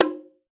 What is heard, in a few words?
shime mute; ETHNIC and WORLD PERCUSSION